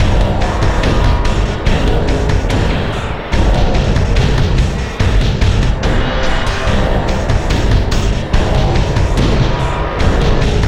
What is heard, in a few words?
Mini Boss Theme; Insanely Twisted Shadow Planet